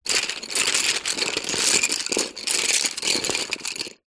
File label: office pencils x30 movement rummage carpet